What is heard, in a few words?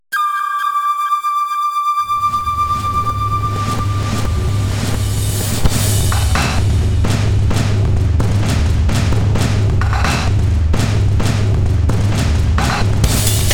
samba